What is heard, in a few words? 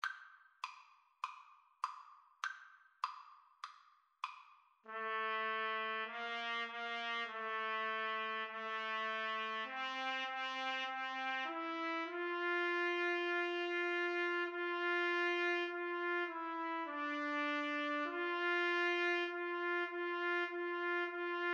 trumpet duet